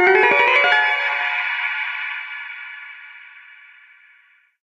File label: climb up, notifications; Sony; Xperia Z3